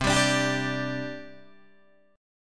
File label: C Suspended 2nd, C, down, Guitar, Open D